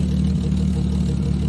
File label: car sound